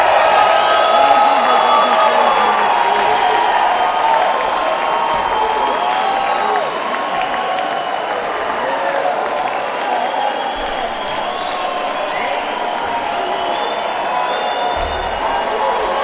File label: Encore Break; NJPAC Newark NJ; AKG 430